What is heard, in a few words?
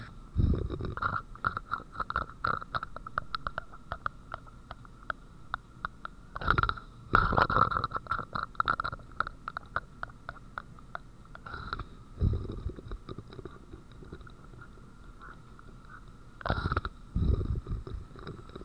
odema hangja sound of oedema